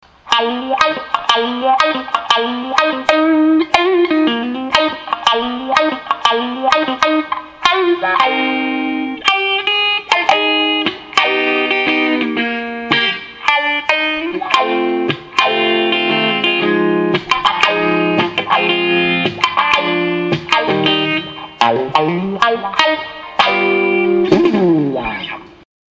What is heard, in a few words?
variwah updown